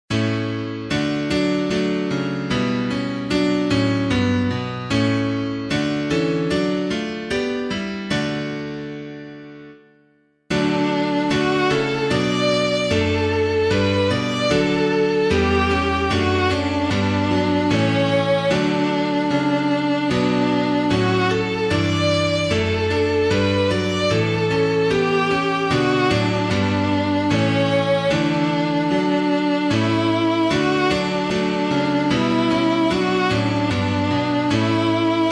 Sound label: piano